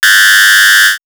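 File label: Shrill Signal, electronic fx; X-Static Goldmine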